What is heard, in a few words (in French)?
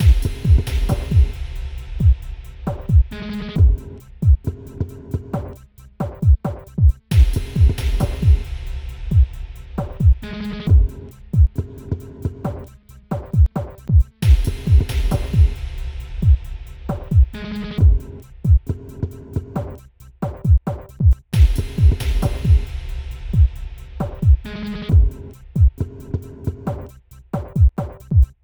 boucle électro rock, 135bpm